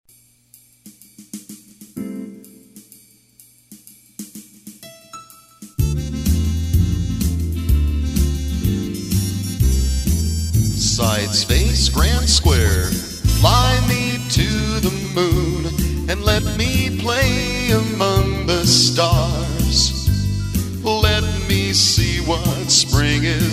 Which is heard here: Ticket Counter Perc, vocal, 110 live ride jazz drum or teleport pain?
vocal